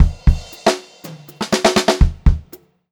122 funky fill, funk groove drumloops; USB Soundscan vol.38; AKAI

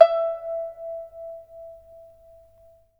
PIPA E6 P; Spectrasonics; Heart of Asia

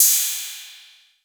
808, CYMBAL; Wizoo - Platinum 24 Electronic

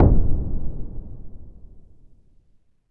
REVERB KICK, DRUMS; Music Store Sound Service; Einstein

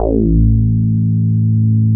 BOW BS A2

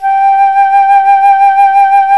FLUTE, Flt vib; C Flutes 1&2; Roland L-CDX-03 Disk 1

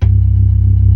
ORGAN, C3 NO PERC; Keith Emerson Signature Series

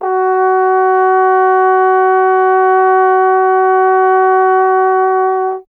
Trombone F#; Miroslav Vitous - Solo Instruments VOL-1

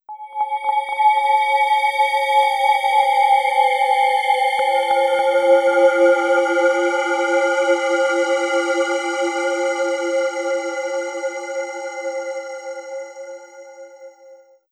Bell Atmosphere; Atmospheres; Sonic Foundry (Sony Creative Software); Slow Silhouette

